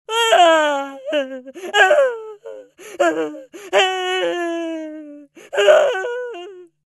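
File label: zvuki placha, crying sounds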